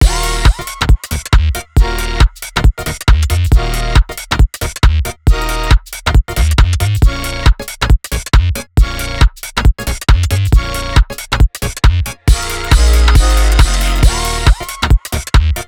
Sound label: Electronic; Electronic Vol4 Music Pack